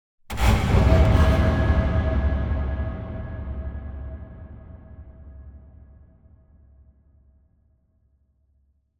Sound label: Impact Fatal Wooden Industrial Hit - Triple; Hollywood Edge; sound designer's toolkit v2